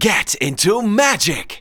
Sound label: vox; Ueberschall; Techno Trance Essentials